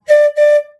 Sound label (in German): Lokpfiff